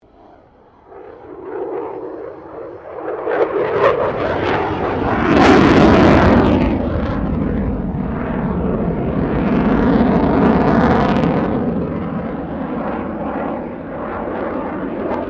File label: AIRCRAFT F16 FALCON MANEAUVERING